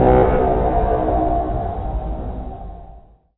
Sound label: rocket alarm; svencoop; rngremake